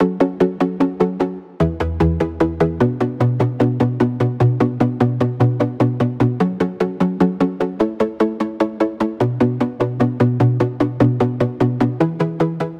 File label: 150 F DanceyArp